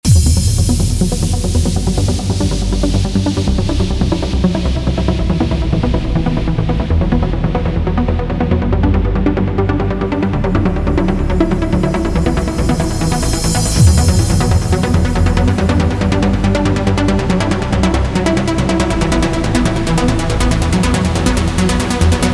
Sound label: Melody Writing Session, trance music